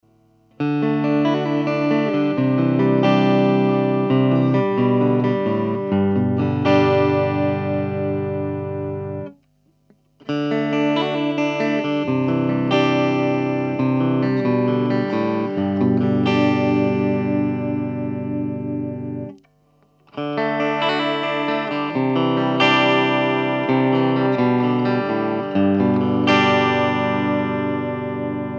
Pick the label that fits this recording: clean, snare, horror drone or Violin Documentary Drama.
clean